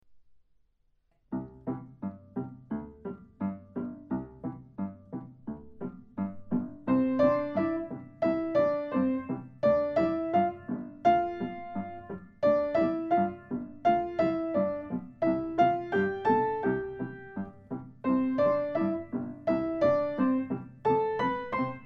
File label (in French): Petite balade sur le clavier